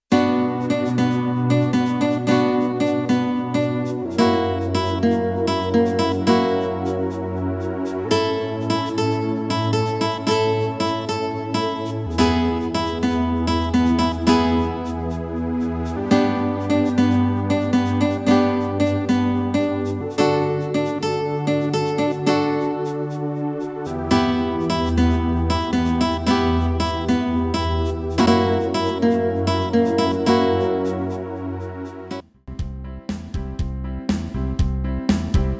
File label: Arpegio